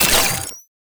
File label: Hack Minigame Unlock; Entrati